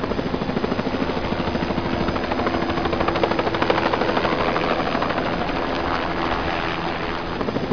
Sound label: dust Amb Loop; cstrike